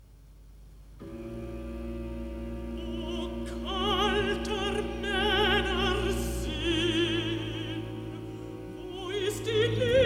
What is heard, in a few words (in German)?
Rezitativ (Alt, Sopran, Tenor, Baß); Bach Oster-Oratorium, BWV 249; Ludwigsburg 1968; IV. Rezitativ O kalter Männer Sinn